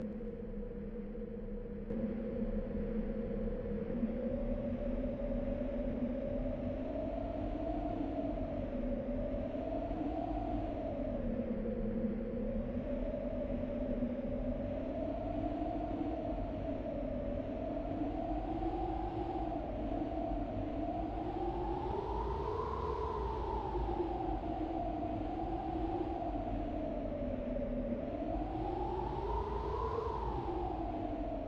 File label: Light Wind; Banjo-Tooie